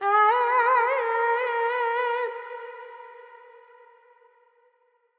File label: FX Dorian, Vocals, Carnatic Female, Alaps, 70 G; Sample Banks